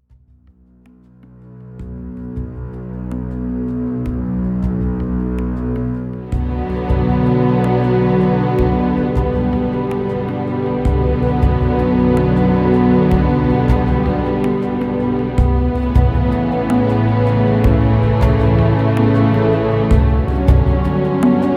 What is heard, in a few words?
folk music